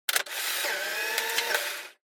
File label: Camera Instant Shutter